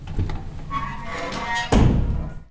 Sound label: Opening Door; blindside